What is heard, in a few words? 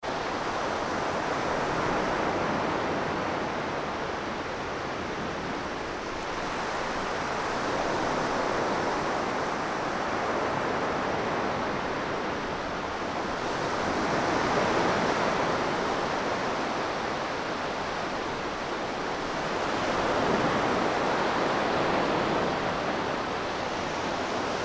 wave